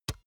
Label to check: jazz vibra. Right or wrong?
Wrong